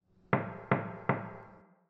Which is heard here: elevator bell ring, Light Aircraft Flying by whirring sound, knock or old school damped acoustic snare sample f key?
knock